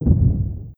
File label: small explosion